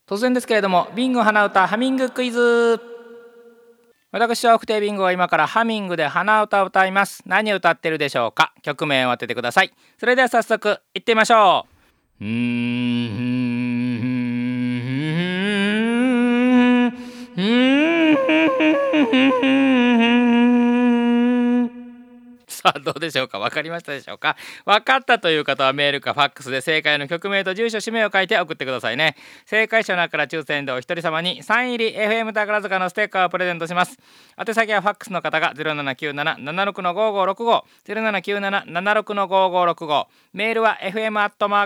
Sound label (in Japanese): hanauta